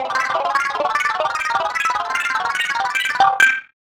Category Reveal

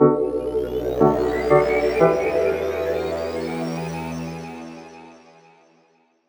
Logo, tones

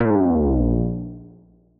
Unsuccessful

